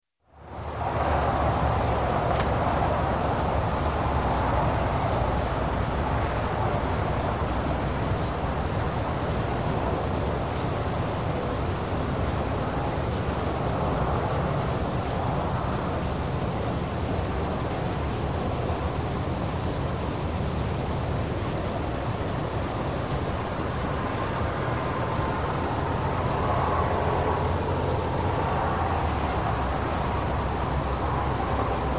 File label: Residential Night Time Residential, traffic And; Sound Ideas 2000 Series; Creek